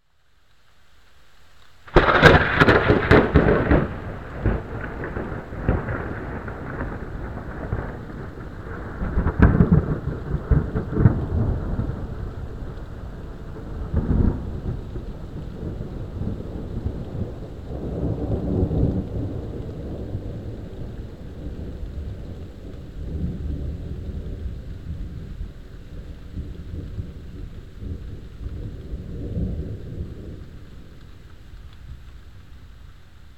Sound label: Another close lightning strike